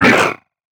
attack; Barotrauma; Husk

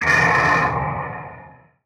attack; Barotrauma; Legacyfractalguardian2

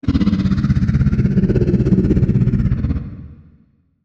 death; Barotrauma; Legacyendworm

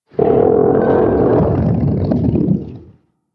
death; Barotrauma; Bonethresher

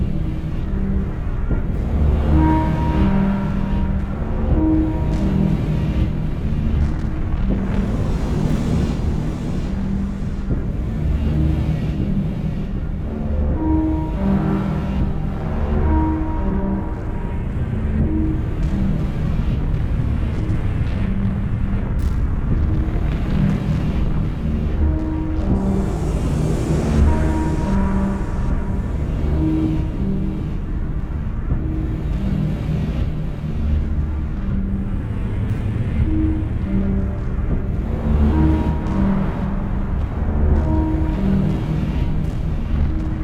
Intensity Layer, Music

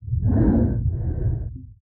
Drown Female

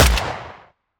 assault Rifle; Security; WEAPONS

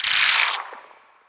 damage; Barotrauma; Spineling

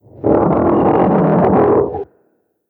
idle, Bonethresher; Barotrauma

